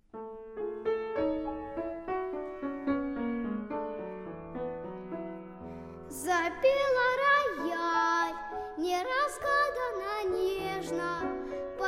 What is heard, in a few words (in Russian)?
vokalnyy cikl dlya detskogo golosa soprano alta violoncheli i fortepia